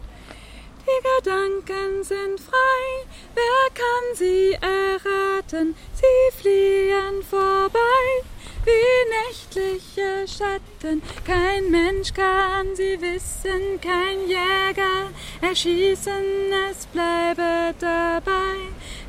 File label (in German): die gedanken sind frei tenor